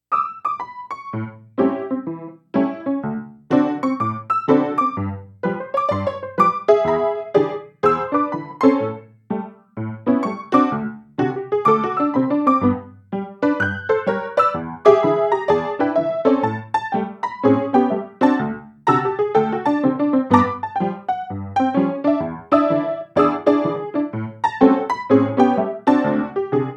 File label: Comic Piano; Korea; OST; Strong Woman Do Bong Soon